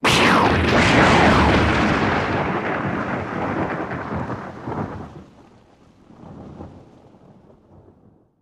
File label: Cluster Violent Thunder